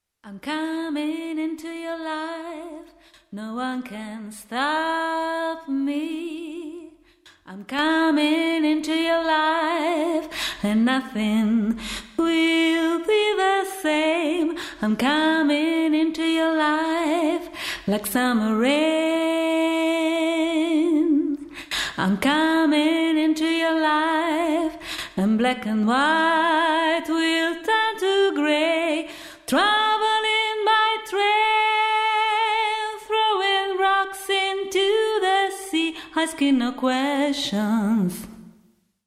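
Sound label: Female Vocals; Lindell Audio Compressor 7X-500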